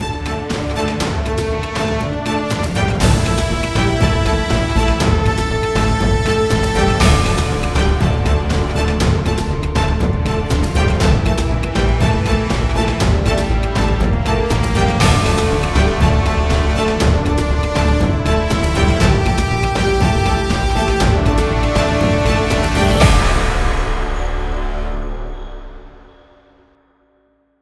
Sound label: tension; by burak sound bank; tension gerilim vol 44